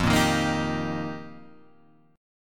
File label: F 7th Suspended 2nd Suspended 4th, Guitar Half Step Down; F7sus2sus4